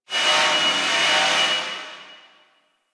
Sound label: Rail Jack Destroyer Machine One Off; Ambience; Crew Ships; Grineer